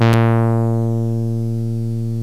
ENSONIQ ANALOG BRASS

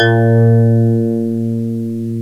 ENSONIQ DX RHODES

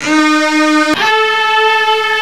ENSONIQ STRINGBOW

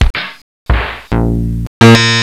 ENSONIQ DRUM SYNTH BASS BASS SOUNDS

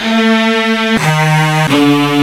ENSONIQ STRINGBOW

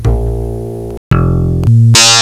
ENSONIQ STRING BASS BASS SOUNDS

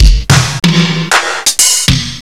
ENSONIQ AMBIENT DRUMS

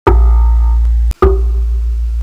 ENSONIQ KALIMBA

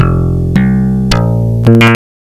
E BASS

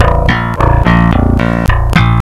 ENSONIQ TOUR BASS SOUNDS

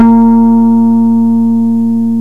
ROLAND BRASS; ENSONIQ

